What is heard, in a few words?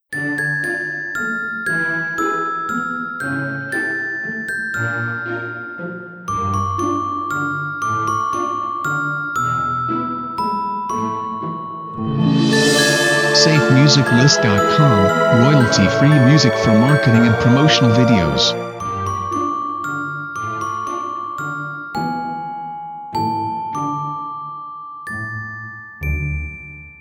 Dreamy Fantasy Intro; SafeMusicList